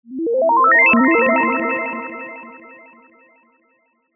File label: magic wand ascend spell beeps; zapsplat